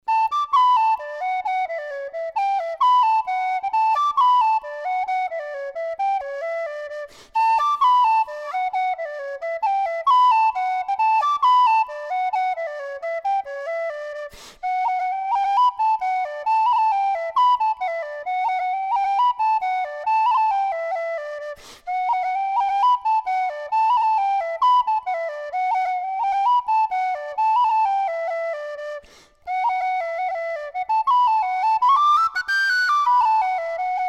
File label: Carbony Leading Tone D